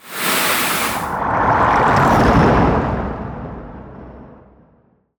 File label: Ice Spell Transformation Shape Shifting; Arctic Aura